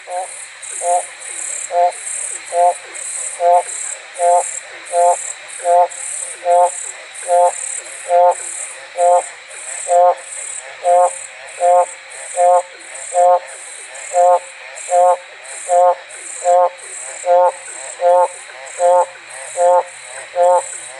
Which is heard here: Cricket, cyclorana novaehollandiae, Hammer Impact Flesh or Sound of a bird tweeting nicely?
cyclorana novaehollandiae